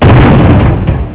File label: crash